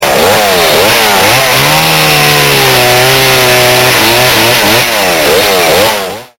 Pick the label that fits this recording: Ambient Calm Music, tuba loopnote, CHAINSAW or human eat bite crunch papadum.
CHAINSAW